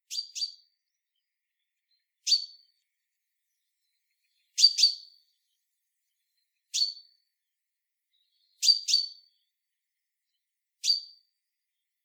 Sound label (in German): Buchfink Fringilla coelebs; vogelstimmen; PINK